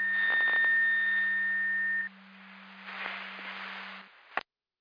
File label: Siren; Hamburg FD